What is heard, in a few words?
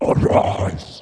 spooky, notes